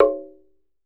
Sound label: TUNG HI, Tongue Drums; E-MU Producer Series Vol. 7 – Old World Instruments